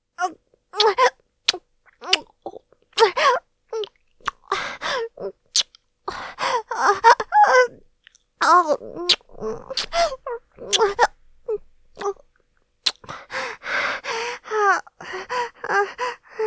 喘ぎ（甘い）; ジト目で爆乳JKうさぎっこ 首輪ちゃんのわけありや 初接客; 喘ぎスパイス！

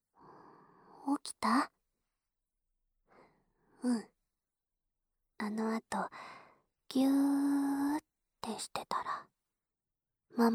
膝枕、耳かきでアフターケア, ASMR

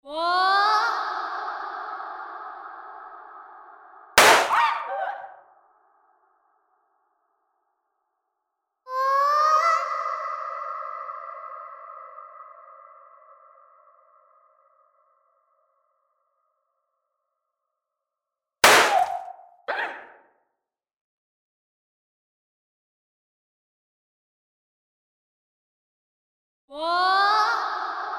じらしスパンキング; 催眠律動音響79

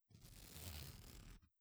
ジーーーーッ

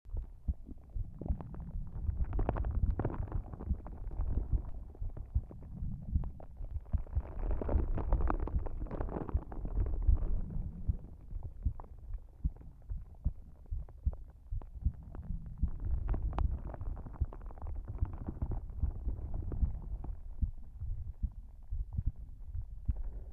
眠るための羊水サウンド, ASMR; ループ対応

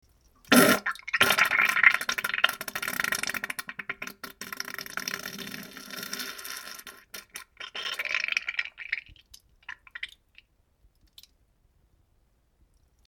げりおなら ぶぶっ ゥりゅりゅりゅぅぅ ぅぅぅ音

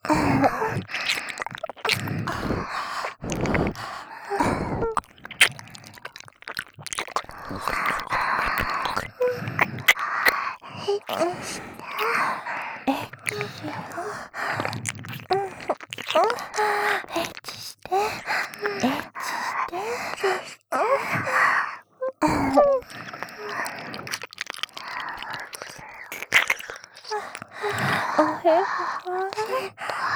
耳舐め喘ぎ; エッチしよ; ロッテ

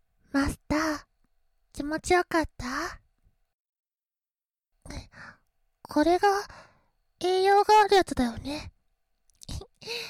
ふぅ…; スー編

